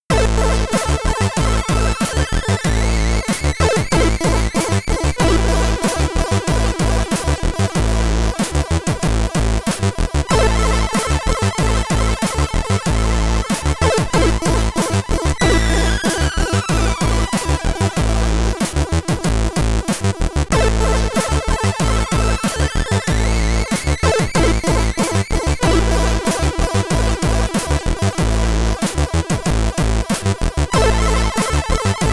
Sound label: Loading Tune; Tales of Boon; Commodore 64